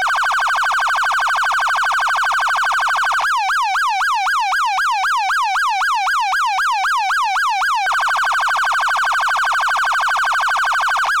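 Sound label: Federal Signal MS4000 Manual, siren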